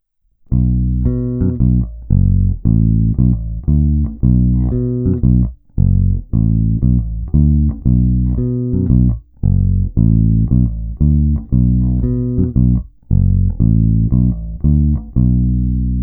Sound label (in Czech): Černá – oba snímače paralelně; Xotic XJ-1T; Černá – oba snímače sériově